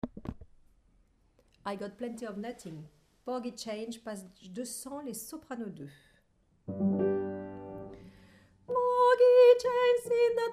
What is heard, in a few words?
Soprano